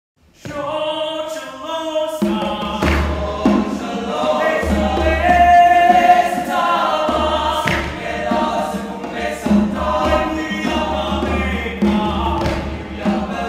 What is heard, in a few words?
Tshotsholoza, Youth Choirs; Cantus